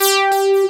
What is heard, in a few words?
PEGGIATE, DANCE; Pro-Rec - Synthsonic; Z1 DANCE